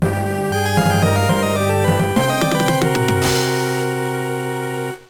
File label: game over; aria